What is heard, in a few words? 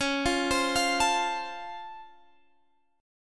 C sharp Augmented Major 7th, C sharp, down, Guitar, Standard; arpeggiated; capo 3